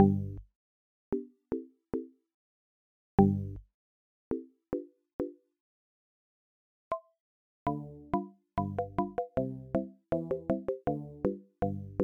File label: polka; Multimedia Toolkit